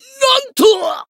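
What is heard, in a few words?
damaged, Japanese; cyrus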